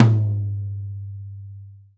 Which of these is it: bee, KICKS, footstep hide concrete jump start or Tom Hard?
Tom Hard